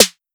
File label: 808 Snare Tight; Slate; Vintage Drum Machines Volume 2 808 Edition